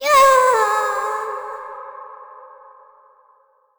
death; Crypt of the NecroDancer

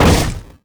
barrel break; Crypt of the NecroDancer

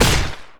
beetle drop; Crypt of the NecroDancer

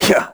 melee; Crypt of the NecroDancer

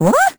teleport; Crypt of the NecroDancer

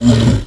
minotaur hit; Crypt of the NecroDancer

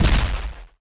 dragon walk; Crypt of the NecroDancer

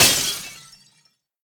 glass break; Crypt of the NecroDancer

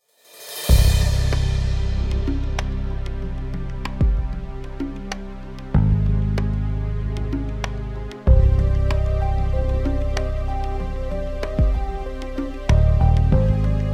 menu music; HiddenInPlainSight

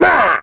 dorian hurt; Crypt of the NecroDancer

